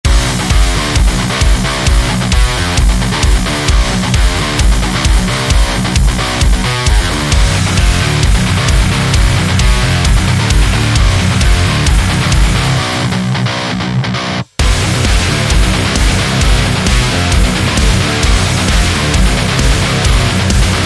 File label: Strong, epic sport metal